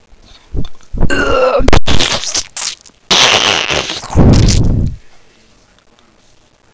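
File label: BUERK, PEOPLE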